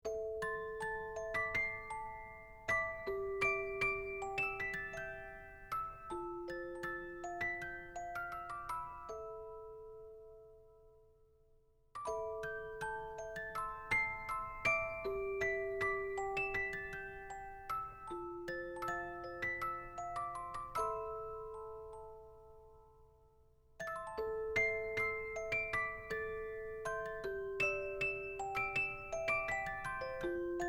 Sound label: Simple Musicbox